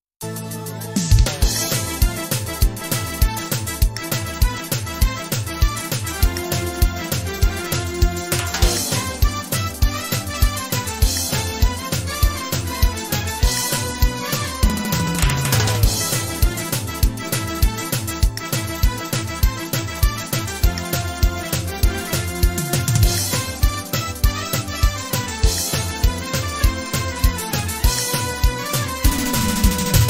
minus karaoke turkmen aydymlar

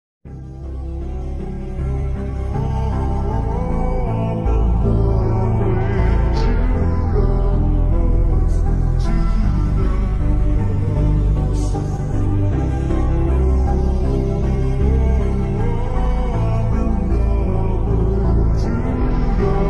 80s version slowed reverb

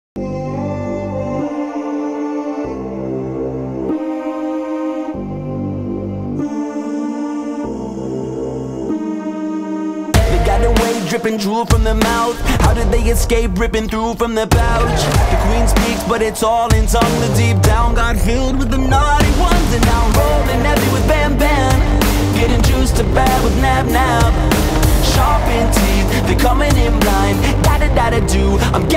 rap song; SFM; Garten of Banban 6

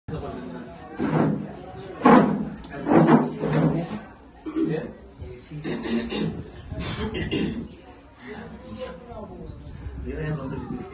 acapella cover

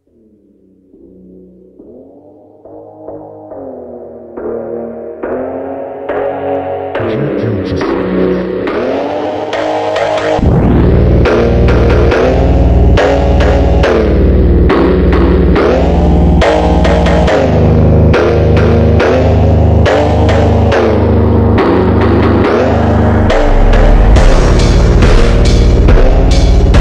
ultra slowed bass boosted

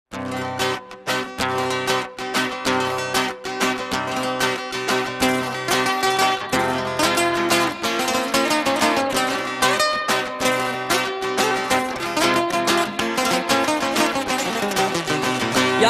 turkmen gitara

